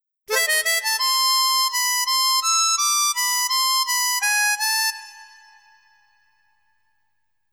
Harmonica; tales of eternia original soundtrack

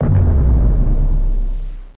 Explode; Learn to Fly 3

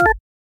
Normal Event; life is a game android

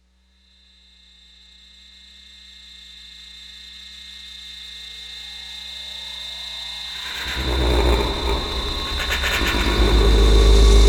door music

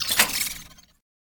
Key Unlock Sci Fi

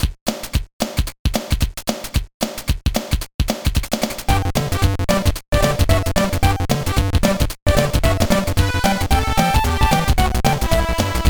BGM; Hachiemon; Gameboy Advance

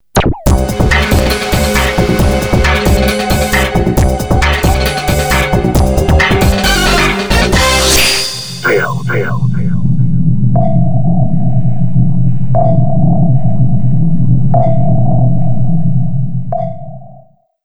No Shoot; Confidential Mission; Dreamcast

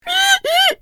Pigfall; bad piggies; android; mobile; windows

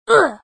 Damaged; Tails; Tails Nightmare 3 Demo

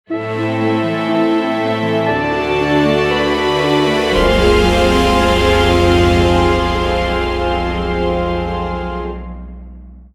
music castle keep intro castle

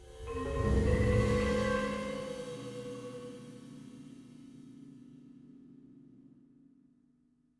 Sting; lego the incredibles 2018 switch

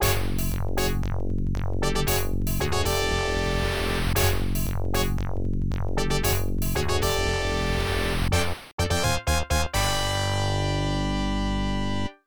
Victory; Ultimate Mortal Kombat 3; genesis

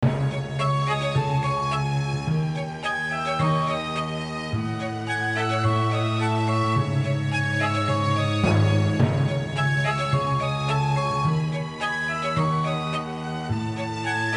Background Music; little big adventure 2 twinsen s odyssey original soundtrack

